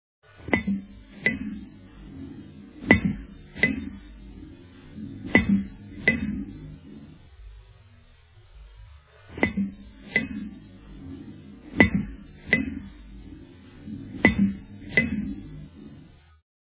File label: interval signal, a characteristic sound or musical phrase used in international b; pol z pr katowice rvd 1975